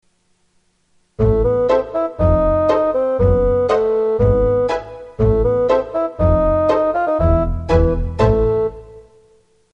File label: opening pizz fag